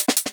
Top Fill Shuffle

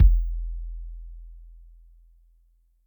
BD long, Electric Kick, Yamaha DTXpress

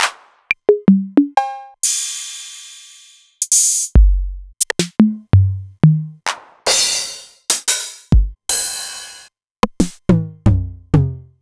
Novation Drumstation; DrumstationV1

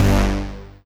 Synth Hit G; Zero-G Trance Inducer; 90 s Sample CDs

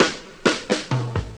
66 RUN FILL, Dark Side Of TripHop

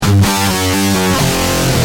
G Heavy, Guitar; Industrial

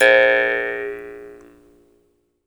Jaw Harps

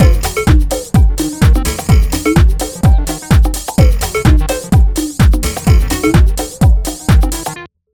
127 ALL, Fresh Disco House; USB Soundscan vol.20; AKAI

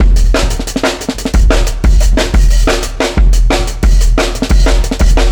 Marching Kit 4 Bar, Drum n Bass Beats; Beats and Percussion; KJ Sawka; Drum n Bass & Beyond

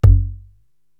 Tabla Open, Korg TR-Rack

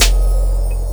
SNARE, Ragga & Dub Reggae; USB Soundscan vol.27; AKAI; SNARES KT

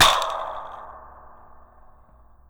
SPRING, Anime Sound FX; COMIC

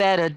vocal

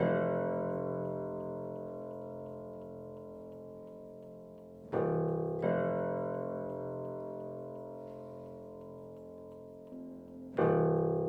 Piano, 70s Analog Funk

